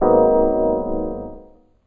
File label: Chord; RH Baseball Math